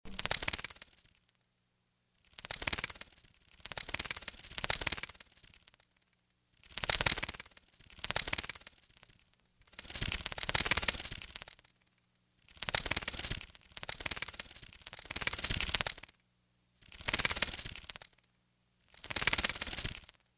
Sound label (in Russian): krylja letaet